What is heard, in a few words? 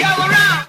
gather around SUNG; Voice; introductions